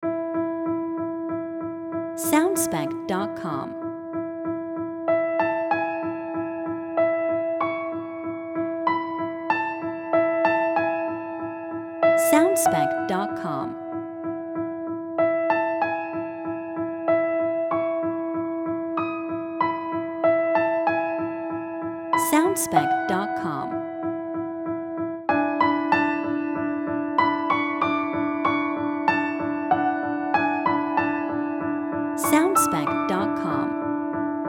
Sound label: Piano Version